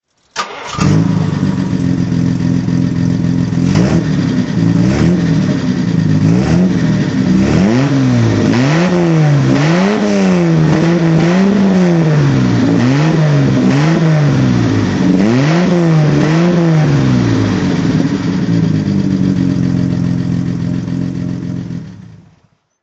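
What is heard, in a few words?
jaguar xk120 ots throttle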